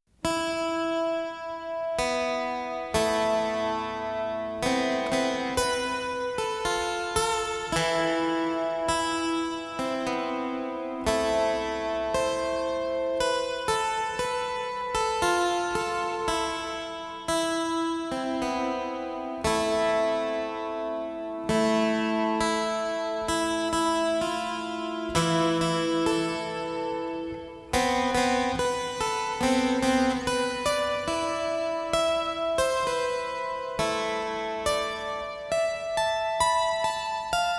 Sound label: live, guitar trio